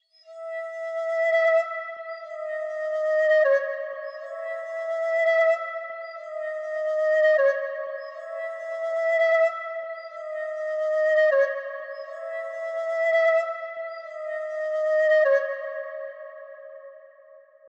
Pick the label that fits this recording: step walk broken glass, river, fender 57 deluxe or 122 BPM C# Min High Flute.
122 BPM C# Min High Flute